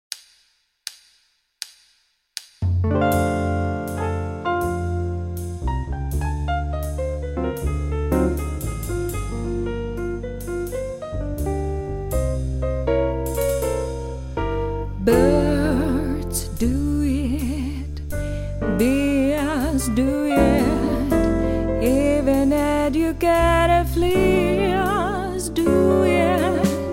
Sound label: song en